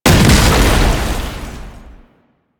Tank Infested Charge Wall Slam; Enemies; Abilities; Warframe; Lasrian

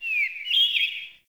Forest Open Glade Bird Med, Day

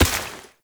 Step Water, Footsteps

